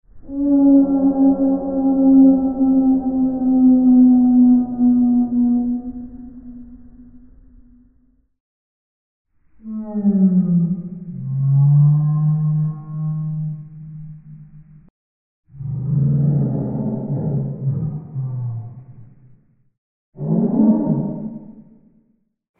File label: mockingbird pitched